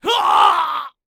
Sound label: Death